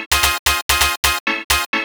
bpm130, synthesizer; homer92

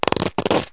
Empty Ketchup Bottle; KABOOM!; Household; Foods

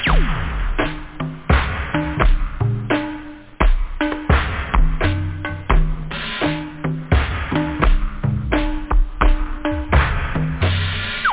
burnin

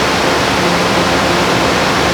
industrial; Fx; willofsteel

